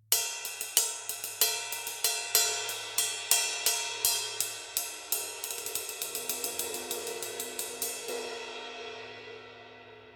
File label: Vintage Pure Ride